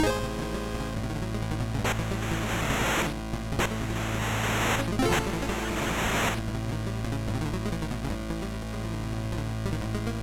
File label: 8Bit Opening Movie titlescreen loop